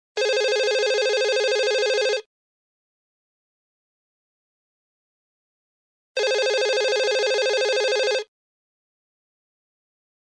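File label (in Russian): телефон, электронный звонок, трубку снимают и кладут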